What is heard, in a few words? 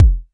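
vermona drm1 mk3 bass drum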